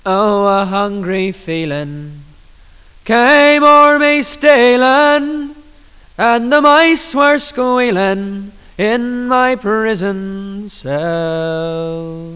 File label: triangle